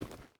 footstep rubber boots forest walk; Rust

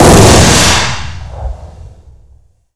Explosions Impacts GAU; DCS

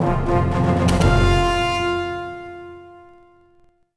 Victory Late; Civ4

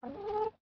sleeping; Rust

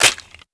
Glock reload clip in, foley; Call of Duty Modern Warfare

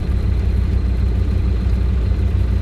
yak cockpit, engines; War Thunder

